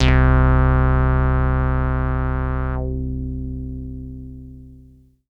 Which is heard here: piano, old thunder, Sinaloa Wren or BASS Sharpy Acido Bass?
BASS Sharpy Acido Bass